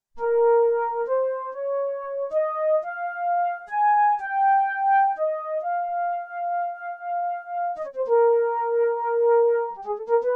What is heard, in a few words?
FLUTE, SIEL DK 80; Factory